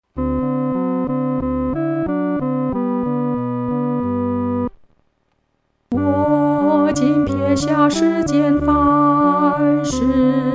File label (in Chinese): 独唱 第二声